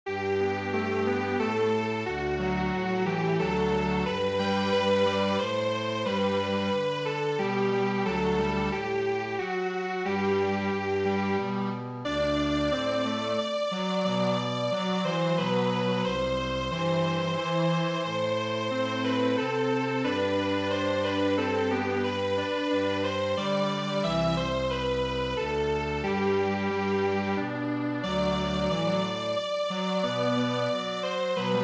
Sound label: Strings Quartet; god save the queen